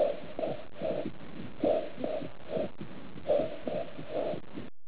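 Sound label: whisper, pulmonary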